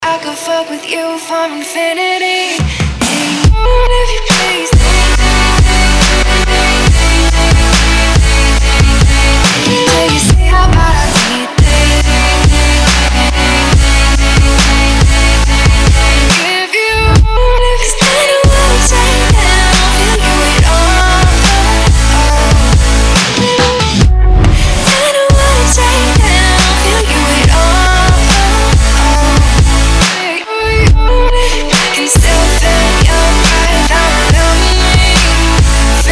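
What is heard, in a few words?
very beautiful melodic dubstep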